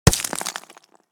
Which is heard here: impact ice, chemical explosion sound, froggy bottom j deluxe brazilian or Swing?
impact ice